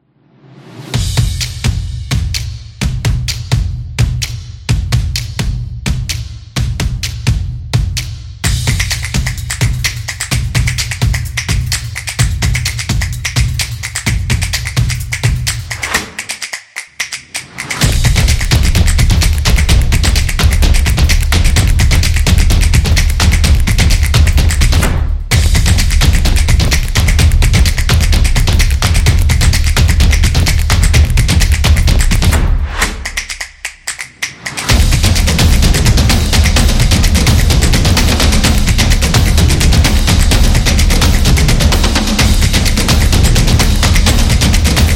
This Is Drums

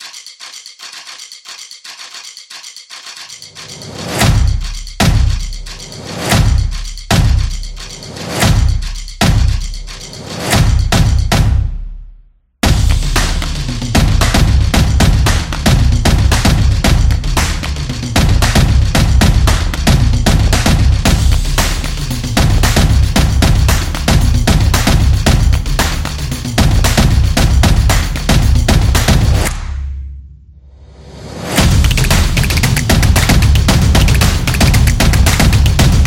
Fast Photo

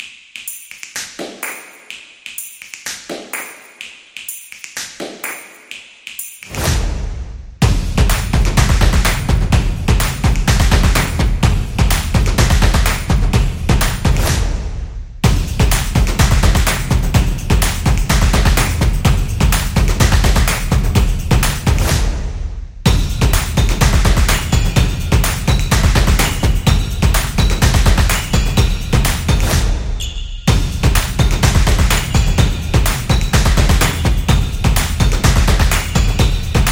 Hit Percussion

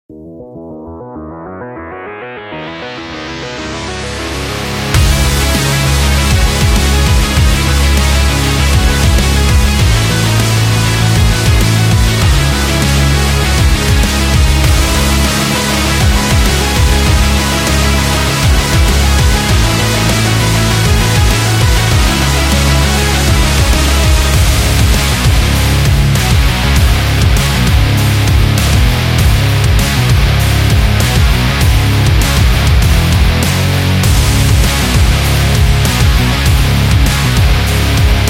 The Metal

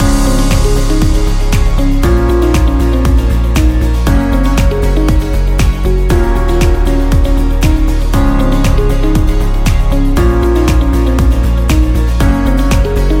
Corporation Loop

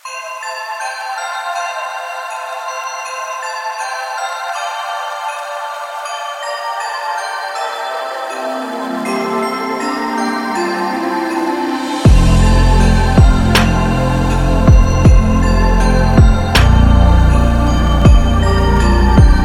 Lofi Christmas

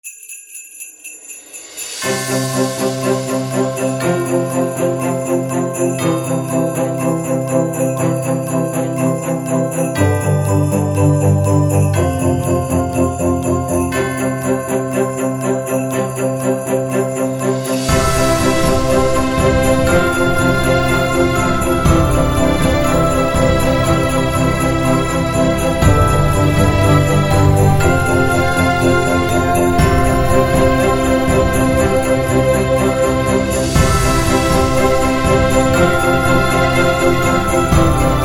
Christmas Story

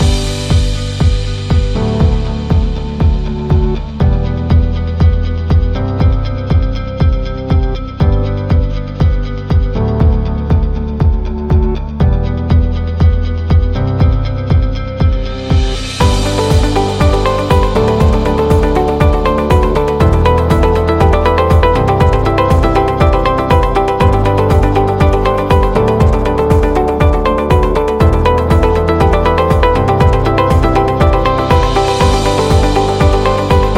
Corporate Loop

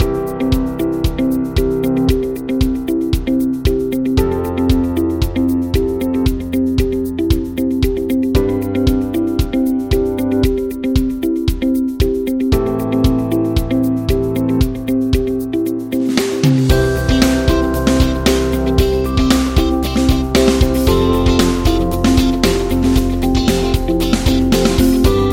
Corporate Positive, corporate music; corporate music vol 6

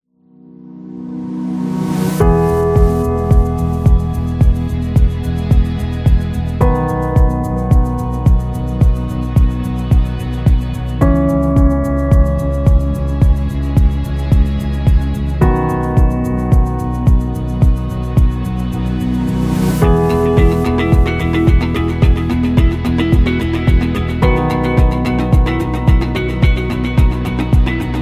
Calm Elegant, corporate music; corporate music vol 17

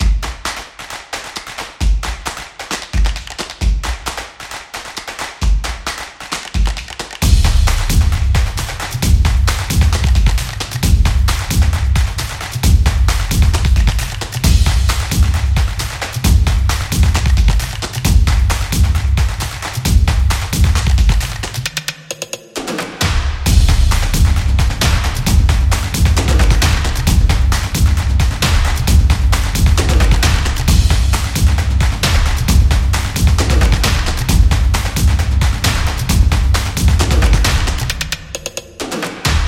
Funny Dance, drumming action

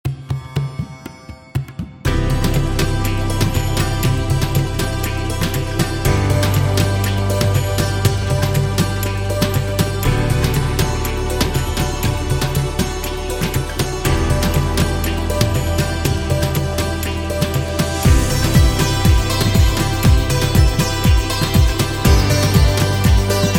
Hindu

